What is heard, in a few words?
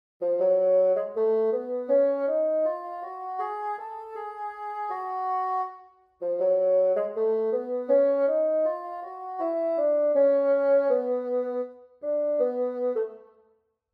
Bass Clef Example